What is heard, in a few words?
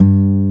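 G 1 OVATION, acoustic guitar; AKAI S6000 CD-ROM - Volume 1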